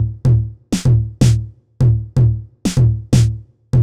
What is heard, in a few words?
drum fixed percussion 125, Classic Chicago House Drum Loops